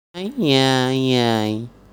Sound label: yay yayy lazy